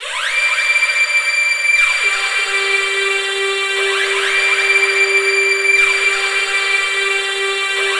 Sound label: NRG SFX, Trance